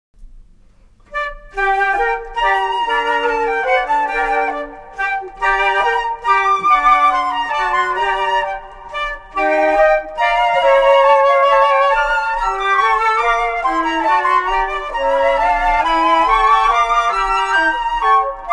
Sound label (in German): menuett; mozart